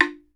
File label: BAMBOO, World Assortment